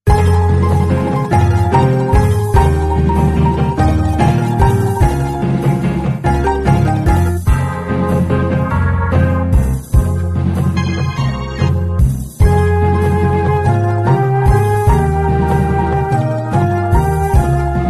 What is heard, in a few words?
Guitar